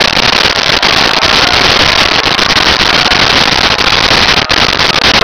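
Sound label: ambience crowd cheer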